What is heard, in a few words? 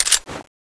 clip out; tfa cso; crimson hunter